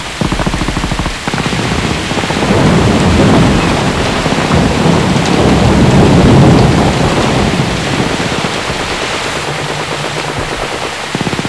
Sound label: thunder rain